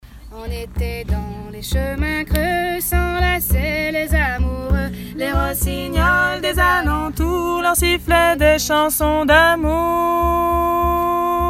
voix haute1; Remembrement

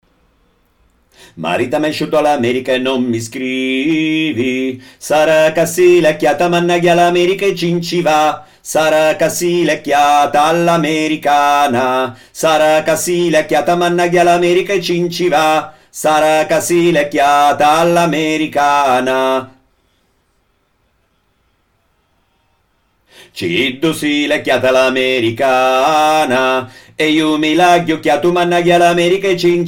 voix2; Lamericanu

